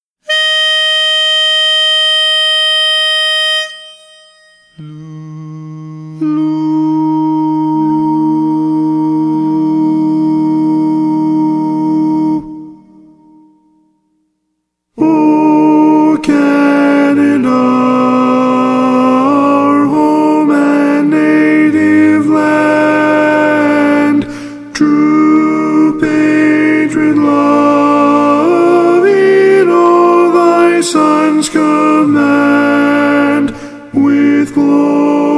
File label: O Canada, Tenor; mono